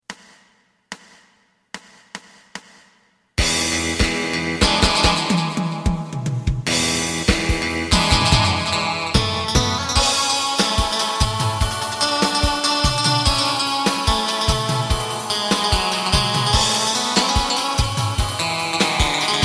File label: bass